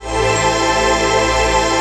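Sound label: chord pad, Pads; Best Service; XX Large - Pads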